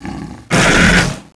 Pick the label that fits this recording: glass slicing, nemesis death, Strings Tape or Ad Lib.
nemesis death